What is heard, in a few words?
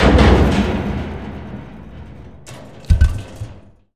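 heavy metal; RockRip Blender; SFX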